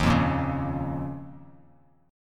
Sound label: D sharp 7th Suspended 2nd, Bass Standard; strummed; JGuitar